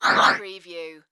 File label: Multimedia Roll Over; sound-effects-bible